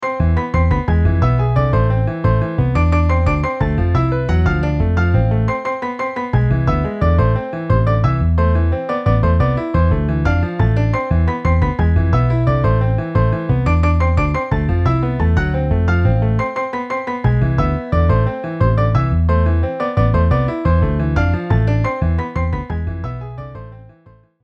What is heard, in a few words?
Piano Bass MIDI